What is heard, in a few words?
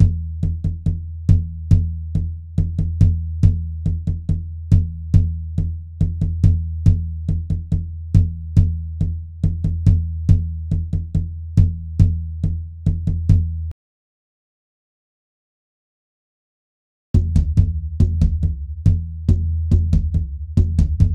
Native American 140 BPM; Перкуссии (Percussion Loops)